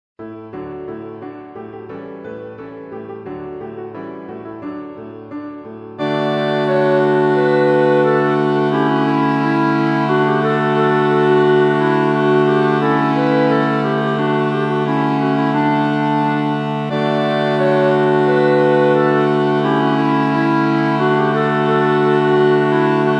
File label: Refrain Ending Tutti; yeomen; Heighdy